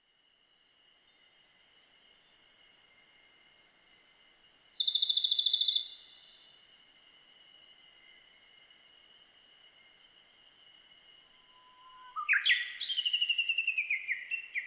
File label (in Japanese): komadori